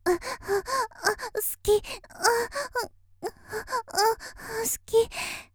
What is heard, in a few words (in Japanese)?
「喘ぎ」（好き）と言いながら5秒; 綾波系少女